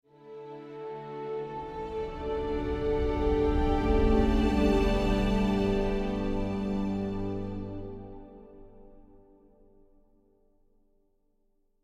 Jingle Celebrate; theHunter: Call of the Wild

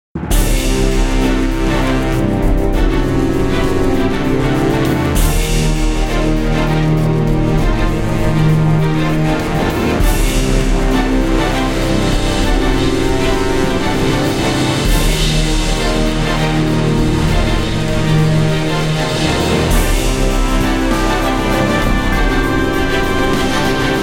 anime loop; cho dengeki stryker windows gamerip 2014

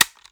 Button

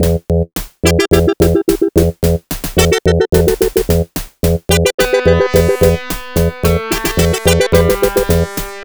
Crash Replay; Race Drivin; Genesis

